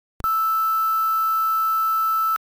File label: cutoff, LSDj Wave Synth